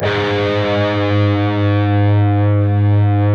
PRS lead; Spectrasonics; Hans Zimmer Guitars VOL-1

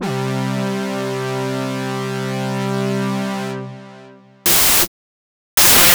Synth C, Synth Prophet One Shots; Electronisounds

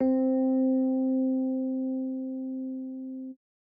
E Piano - C; 99Sounds; Monosounds; Music Loops; Instrument Oneshots

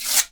guiro, Synclavier Percussion, World & Orchestral

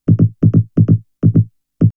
Vocoder Loop; X-Static Goldmine; VocoderLoops

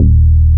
FAME D#1, BASSES

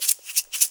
MULTI EGGS; Best Service; World Colours

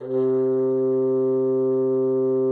C3, bassoon; Xsample VOL-6

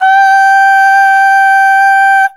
Aah Short G4 Dry

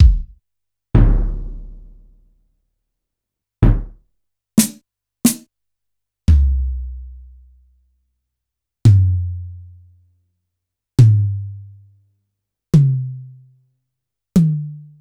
Yamaha PSR-E323 Symphony Kit

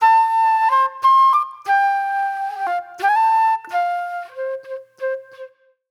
Reflective Flute; Sonic Foundry (Sony Creative Software); Manhattan Flutes

